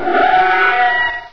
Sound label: baby panther pain; svencoop; custom monsters